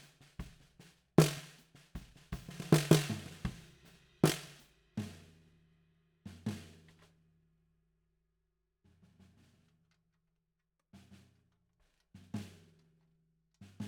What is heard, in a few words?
SNR